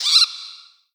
ghoul attack; Crypt of the NecroDancer

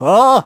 ice slip; Crypt of the NecroDancer

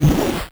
ghost death; Crypt of the NecroDancer

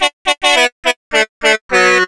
horn; TrackMania Nations Forever; Skins

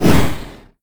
dragon attack melee; Crypt of the NecroDancer

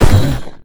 minotaur wall impact; Crypt of the NecroDancer

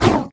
blues piece death; Crypt of the NecroDancer

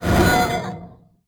nightmare attack; Crypt of the NecroDancer

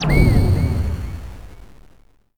spell need; Crypt of the NecroDancer

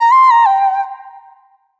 blues queen sing; Crypt of the NecroDancer

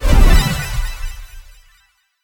spell enchant; Crypt of the NecroDancer

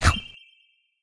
item food; Crypt of the NecroDancer

